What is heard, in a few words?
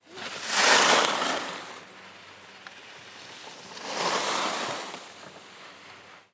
Ski Passby